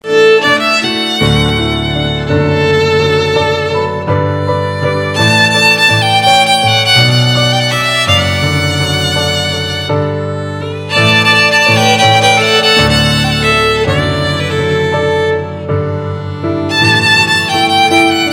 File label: violin ringtone